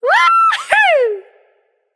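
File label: yay, female; smite; voicepack; hera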